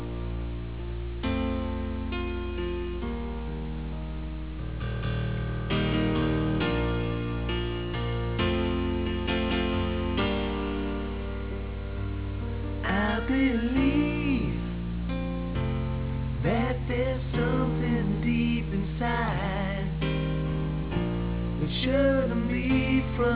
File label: heartbeat